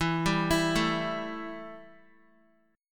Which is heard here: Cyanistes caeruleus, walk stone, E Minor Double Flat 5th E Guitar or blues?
E Minor Double Flat 5th E Guitar